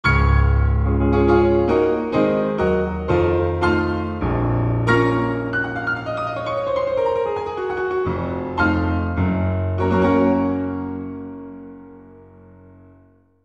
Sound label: Concert Hall; xv-5080; SRX